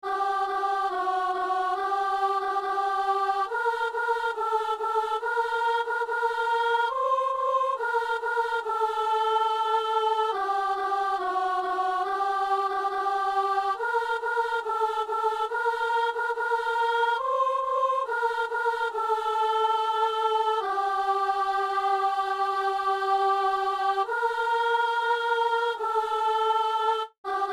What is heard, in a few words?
soprano; Messe de San Lorenzo - Sanctus